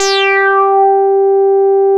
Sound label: G5, Bass; CRANDLE; E-MU Producer Series Vol. 6 – Analogia Project (CD 1); Boom da Bass 1